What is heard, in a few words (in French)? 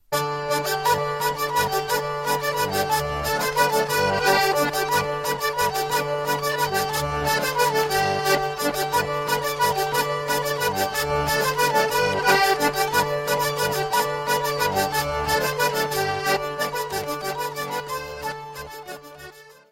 Mazurka - France; Lapleau; Pas d Ete a l Henri Caillaux